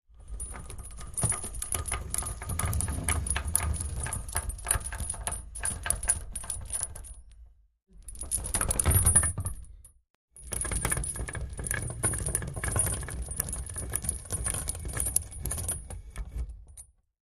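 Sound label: Chain Heavy Towing, chains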